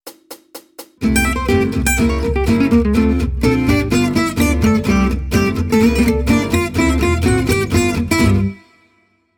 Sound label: Jazz, Guitar